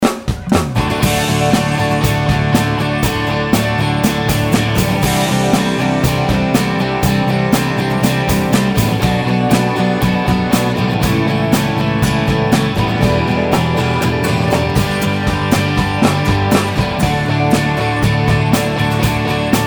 Tele tutti; 18W TMB

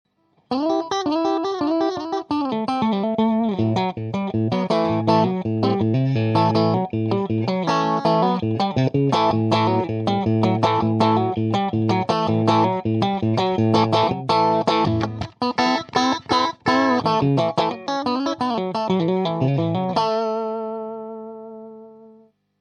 Tweed Strat country

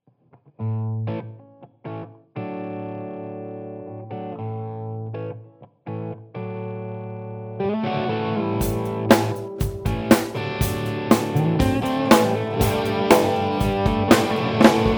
Les Paul w OD Pedal

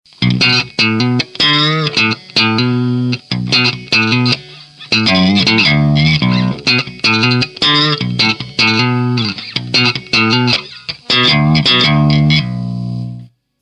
JTM45 Cort Catana clean

